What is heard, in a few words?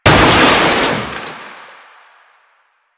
Car Metal Smash; Warframe; Ambience; Nineteen Ninety Nine